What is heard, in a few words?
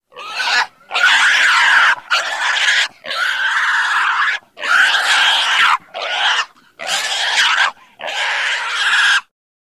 Pig Squeal Piglet